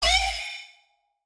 Phase Plant, bass

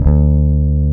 BASS D2, Electric Basses; PlatinumPhattCD2; E-MU Producer Series Vol. 8 – Platinum Phatt

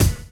DRUM CHOP; AMG Gota Yashiki Groove Activator

